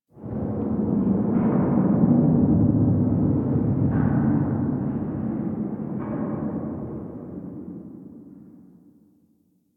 Distant Eerie Metal Bangs

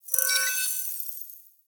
Choir Chorus End

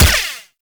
Rifle Lancer Gun Shot; Warframe; Narmer; Weapons

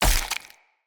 Claw Small Impact Flesh, Enemy Unarmed; Weapon Impacts

